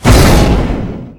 whoosh firey

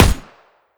smg1 single

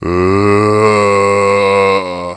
zombie alert

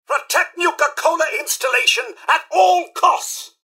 attack; nukarobots; mrhandy

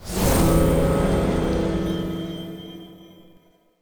battle harp; macha

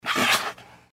gecko claw atk vox; npc

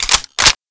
aug clipin, weapons

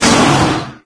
pain right arm, wraith; doom3

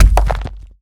stone

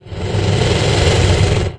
pissed, bear

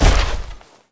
step walk

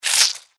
mantis hiss; npc

